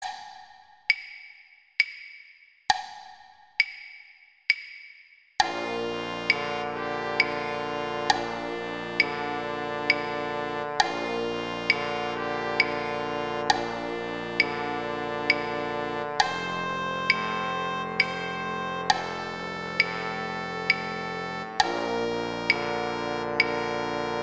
Trumpet II in Bb; Brass; Gruber; Silent Night

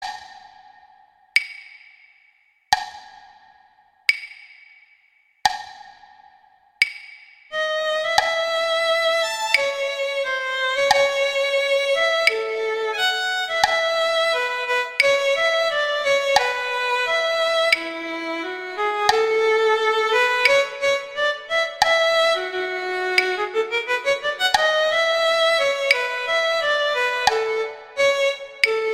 Quasi Adagio Guitar; Paganini; Sonatas Op1 Vl Gu; Sonata No 5

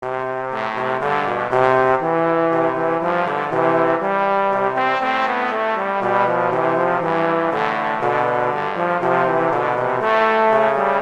Duet; Mozart; Duets

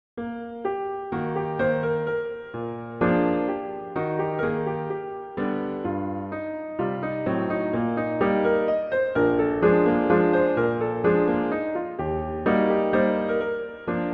Waltz, Eb major; Chopin; Waltzes; Op posthumous

